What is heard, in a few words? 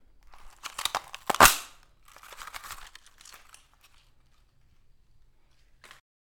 Christmas Cracker